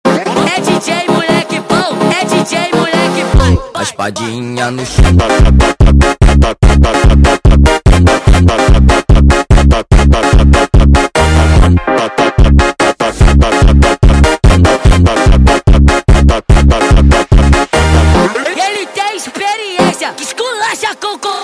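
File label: sped Up, phonk music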